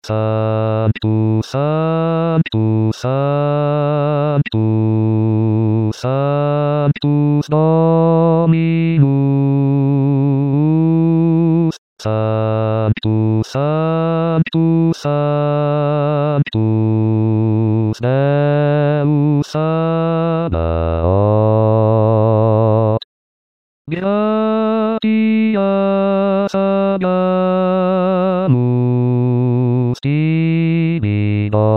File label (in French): sanctus basse